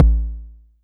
BD; TRX

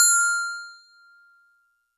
StarPiano DX100 F7

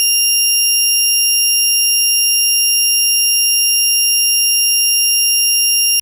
FM Sawtooth DX100 F7

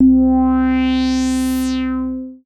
SYNTH

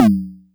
Tom; Tom FX; TRX

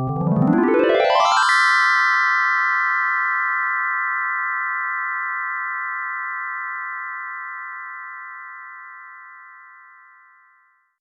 SEQUENCE Square Harpz

